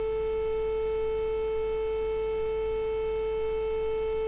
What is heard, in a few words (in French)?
tonalite telephone